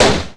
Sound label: gunshot; Tony Hawk's Project 8; XBOX